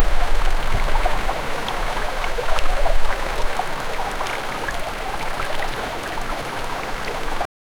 many fishes swimming and, river

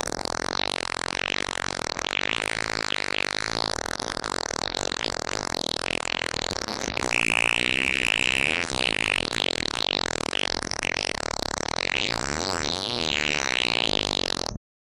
super bass boosted liquid

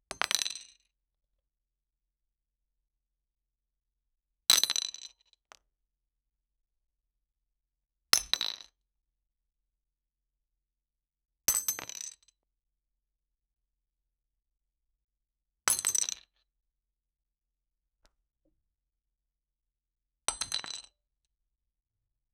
thin glass of pics droppi

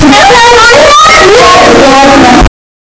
classroom

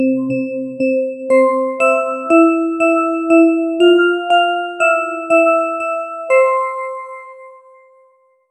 a short minimalist victor, chime

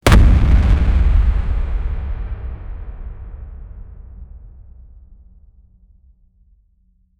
viking horn impact

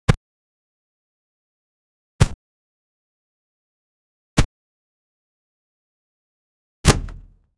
punch hit sound, body; sound effect

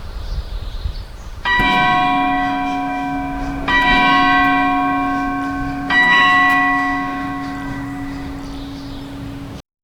church clocks in early mo

